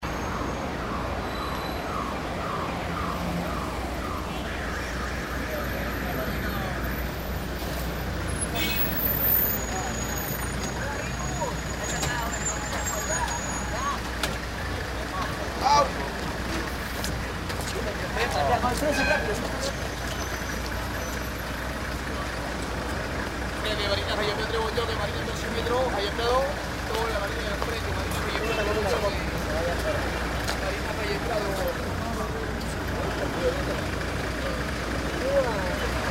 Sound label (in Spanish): paradero bus mediodía; AV LA MARINA